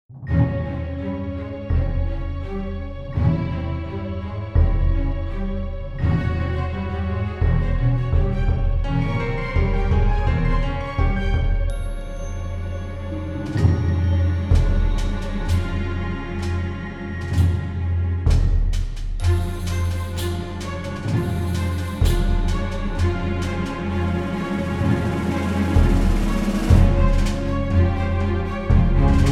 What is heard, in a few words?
Tension Mounts